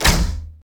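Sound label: weapon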